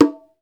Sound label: bongo, Roland; Club-50 - Foundations Roland; Hybrid Kits; Thin 1